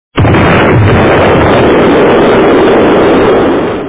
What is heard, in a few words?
explosion